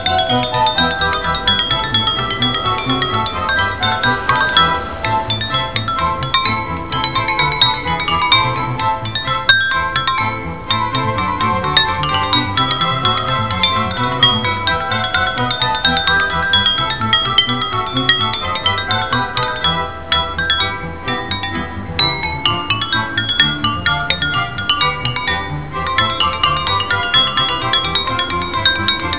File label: man playing glasses, Budapest; Hungary; Men; Europe; People